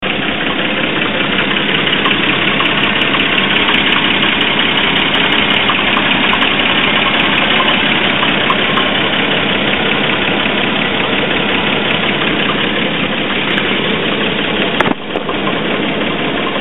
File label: knocking